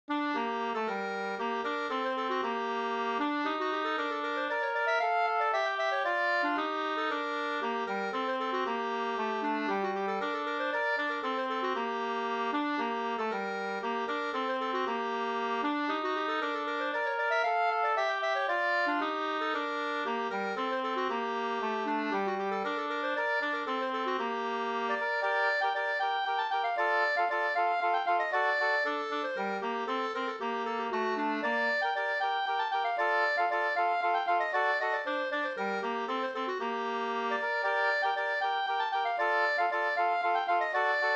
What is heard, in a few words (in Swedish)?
polska g moll